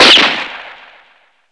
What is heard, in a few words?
DRM D#, YAMAHA R5+RX; Best Service ProSamples vol.55 - Retro Sampler; AKAI